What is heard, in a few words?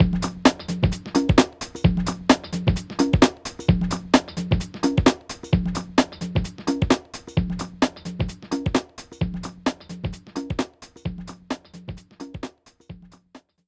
Loading Loop